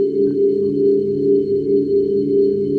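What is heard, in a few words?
Ambience Sci-fi Energy Loop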